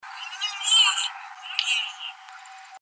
gibbon play vocalization; racine

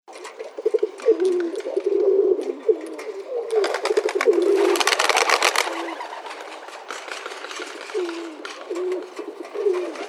rock dove calls and wing noise recorded in poland; stanislas wroza

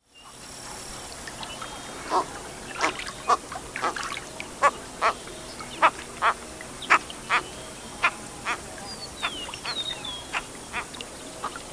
Cattle Egret; Paul Marvin

